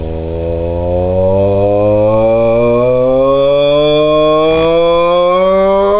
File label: voice sweep 8bit